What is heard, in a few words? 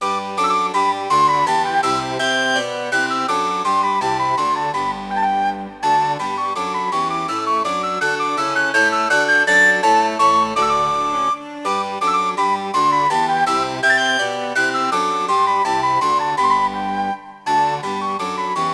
dance normal; Sid Meiers Pirates; XBOX